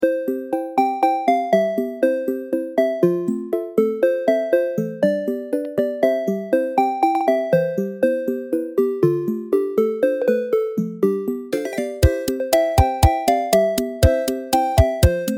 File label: farming sim; freepixel; Casual